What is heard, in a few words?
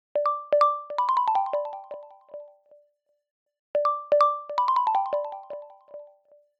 drizzle, ringtones; OnePlus 5T Stock Ringtones